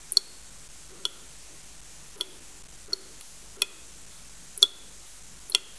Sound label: buzzer; svencoop; sence; mistake coop